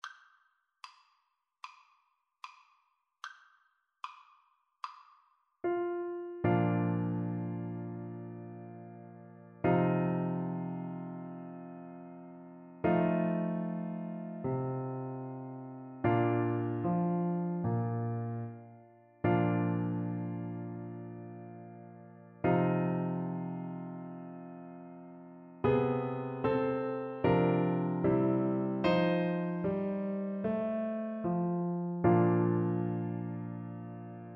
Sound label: america the beautiful, Tuba